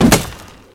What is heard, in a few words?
repair stone